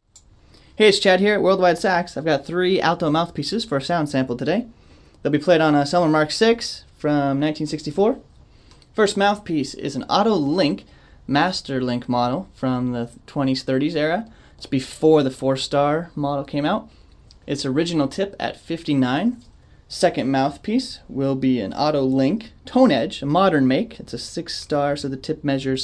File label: alto sax; masterlink; toneedge77